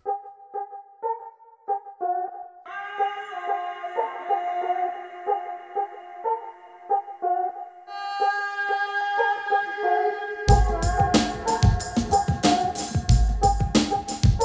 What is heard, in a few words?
Desert walk; Forest